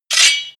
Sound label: sword knife pull out